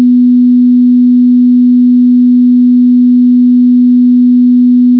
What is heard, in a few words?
tuner b